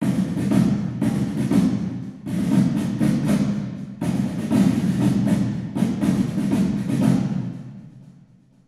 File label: Wins Drums; Agatha; chivalry medieval warfare 2012 pc